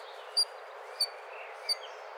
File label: Fulica atra hight; Common coot; Common coots can also produce very high-frequency sounds